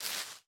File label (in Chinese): 苔藓踩踏声